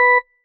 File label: marimba tissue; ゆめ2っき